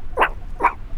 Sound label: Meerkat bark alarm call at a predator